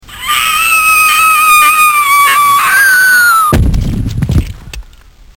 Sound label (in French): Chuuut